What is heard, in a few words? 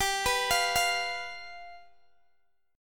G 7th G down Guitar DADGAD